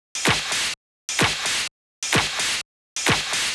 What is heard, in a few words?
perc 128, Electro percussion loops; Anthemic